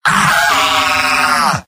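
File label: pain critical death; demoman; video games; source engine; tf; mvm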